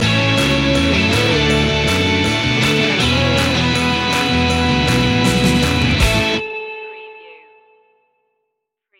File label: maximum rock n roll stinger